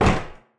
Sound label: duct; Door; Barotrauma